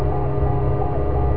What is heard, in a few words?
Consoles; machines